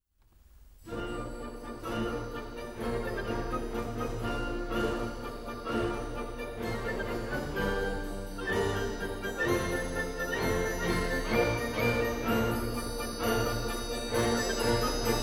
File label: Turkish March; Beethoven